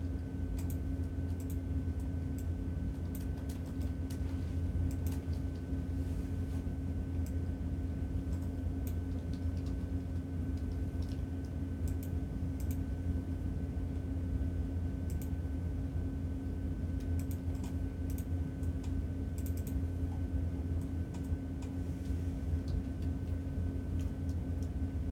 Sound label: computer lab; Golden Crayon Sounds